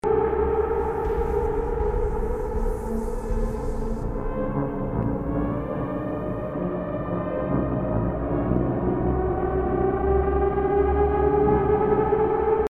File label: atmospheric; quake 4